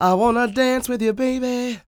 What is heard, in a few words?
croon, Vocal Planet Blues Soul; Spectrasonics